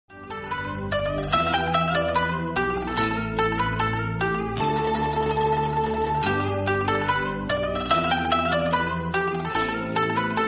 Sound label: Hindi Ringtone Chinese